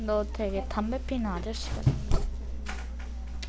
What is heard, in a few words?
cry